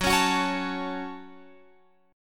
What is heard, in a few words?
Gb Minor, Guitar, Open F; strummed